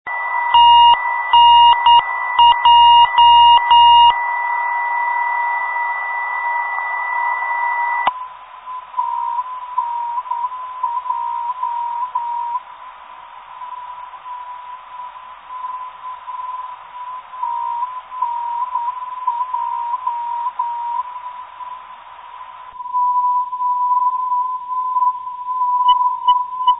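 318 kHz 400 24 8 Hz filter, non-directional beacon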